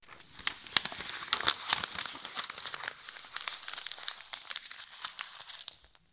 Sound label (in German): Papier zerknüllen; geraeuschebingo